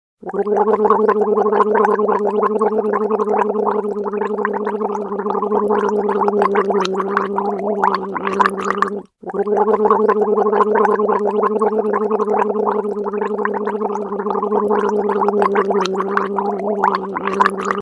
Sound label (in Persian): gargle, صدای غرغره کردن